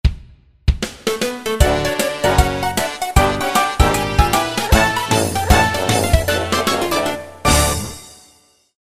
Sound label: Jingle; Streaks; Wizadore